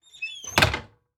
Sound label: Household Pantry Close Door Squeaky